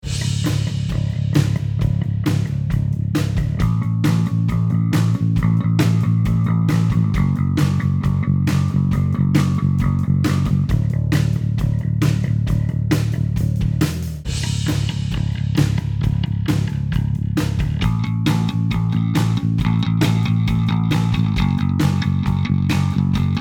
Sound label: Unreal Bass Sounds